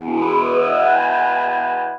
wah

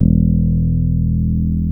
beer belly bleed, enhancer

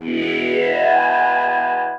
ue ue yeah

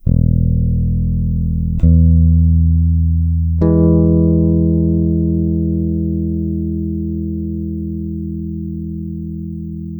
bassement plague mix, distortion

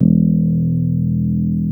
beer belly no bleed, enhancer

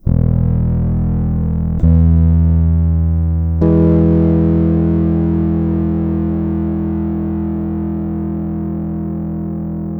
amp squeezer mix, overdrive; Frequency-shaped overdrive.

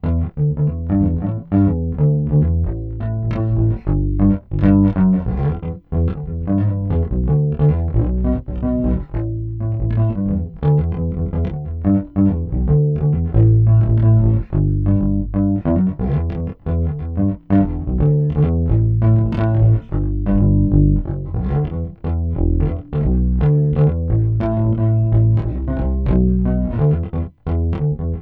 bass; tetraplopia

